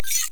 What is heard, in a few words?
Camera Aim Connect; Warframe; Veilbreaker; Kahl